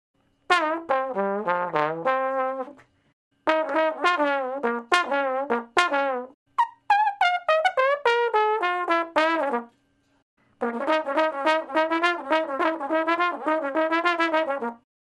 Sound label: Trombone Solo